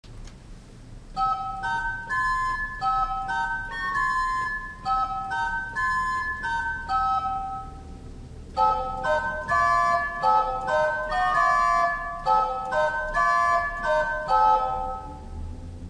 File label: Organ Positiv Sequialtera